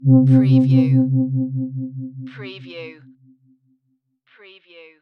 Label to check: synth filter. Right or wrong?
Wrong